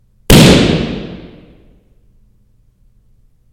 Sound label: POP; Garrison